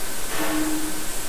Colpo; Forte Belvedere

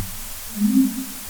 Mugugno; Castello Salizzole

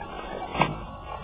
strofinio seguito da un colpo

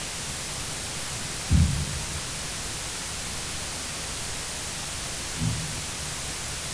Passi o colpi

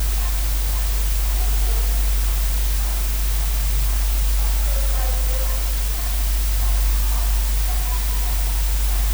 Rumore ambientale abbaio ed anomalia voce vicina al microfono urla

